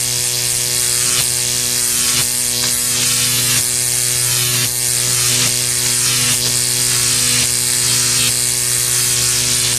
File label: Electro; guns n zombies 2014 pc